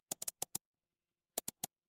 office mouse click

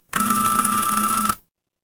office phone vibrate

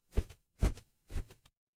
paper fabric cloth whip

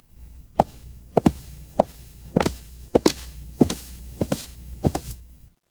footsteps concrete

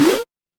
retro game jump

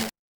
feedback error

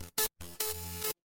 feedback error

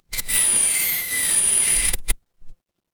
mechanical machine

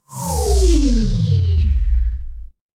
transition sweep

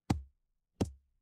transition braam

